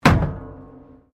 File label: METAL BIN IMPACT PLASTIC CRASH BARRIER